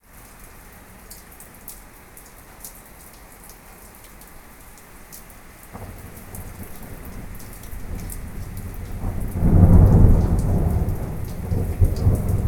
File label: outdoor